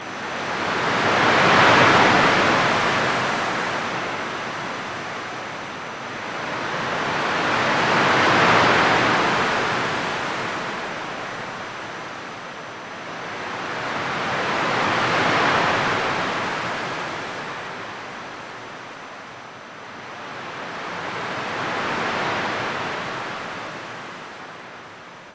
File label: Sms From Sea